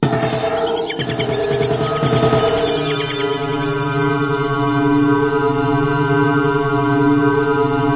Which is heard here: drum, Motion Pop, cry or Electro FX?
Electro FX